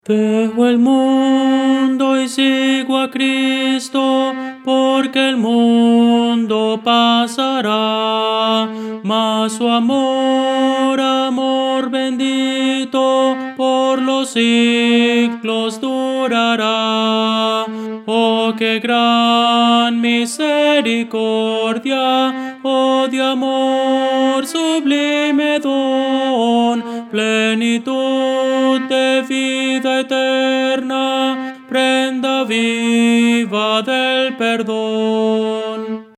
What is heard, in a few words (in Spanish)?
tenor, himnos cristianos